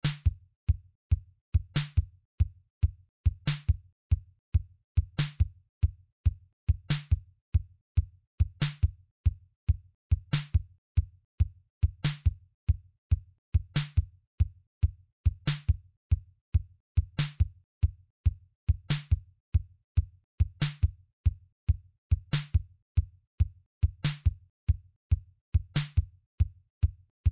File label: piano